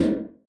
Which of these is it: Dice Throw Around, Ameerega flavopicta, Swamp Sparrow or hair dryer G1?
hair dryer G1